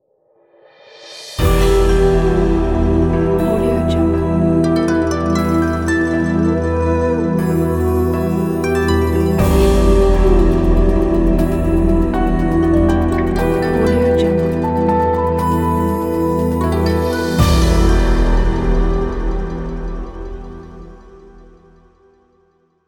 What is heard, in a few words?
enigmatic desert logo